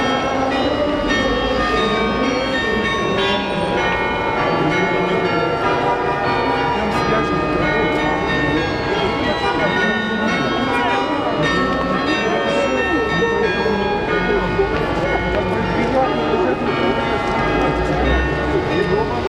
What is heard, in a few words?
Carillon Concert; Jerusalem International YMCA